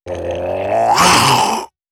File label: zombie attack